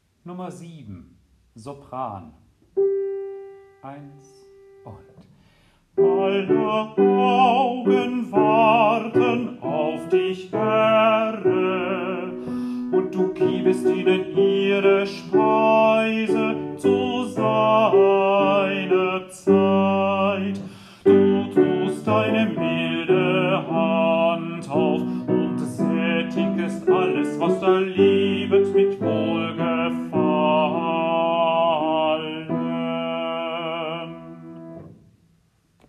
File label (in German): Sopran; Dioezesan Caecilien Verband; DCV Chorfahrt; Übaufnahmen